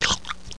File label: drink; dogz